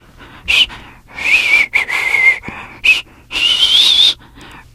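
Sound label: nervous whistle, teen girl; video game; source engine; left4dead2; survivor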